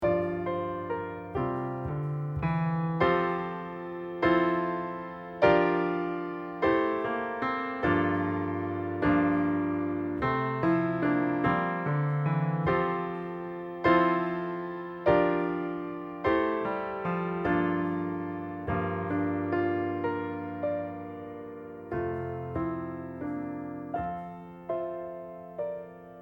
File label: accomp; skye boat song